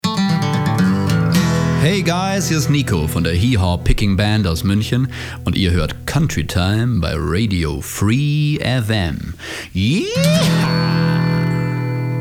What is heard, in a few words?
Jingle